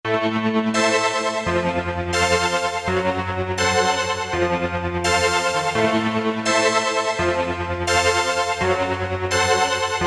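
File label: synth ice forest; E-mu Proteus FX; Factory Presets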